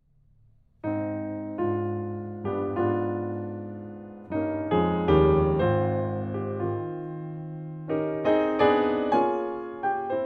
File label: Piano Sonata op 21 2nd mvt; Chaminade